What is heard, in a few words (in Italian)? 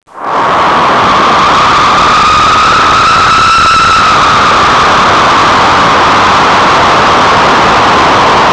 natura nature